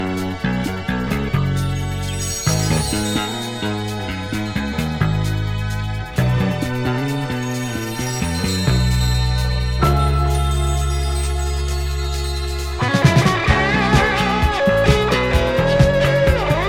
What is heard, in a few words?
Gitara